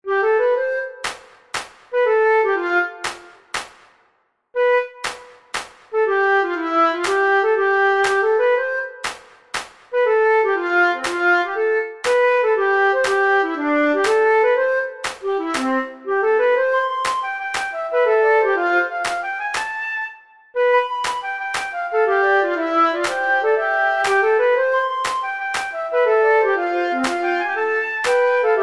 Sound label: frolicking flute